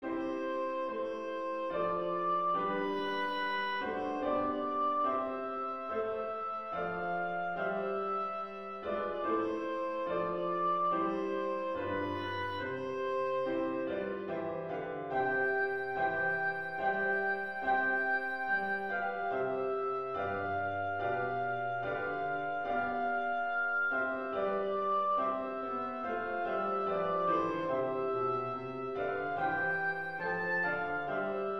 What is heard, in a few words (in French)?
hymne national anglais